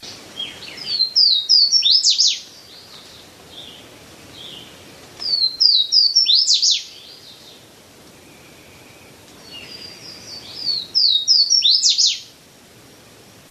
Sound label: Swainsons Warbler